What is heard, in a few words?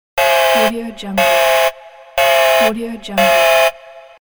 alarm loop